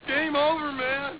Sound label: game over; xboing